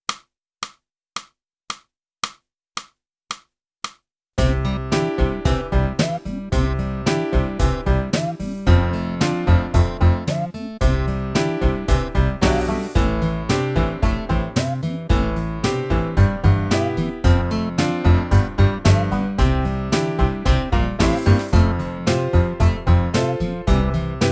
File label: flute; boosey